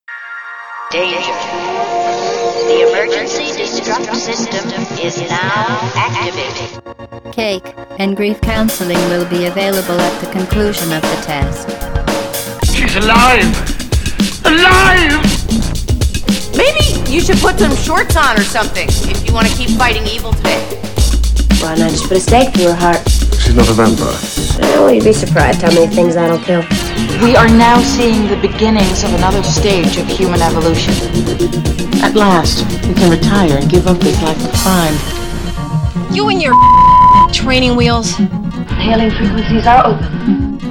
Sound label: Intro Music